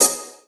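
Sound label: HH, hi-hat; Sonic Foundry (Sony Creative Software); Esoterik Beatz; Cricket; Criket Kit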